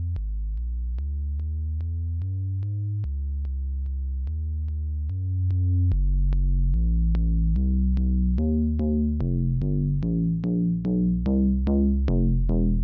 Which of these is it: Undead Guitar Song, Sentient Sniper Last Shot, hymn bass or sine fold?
sine fold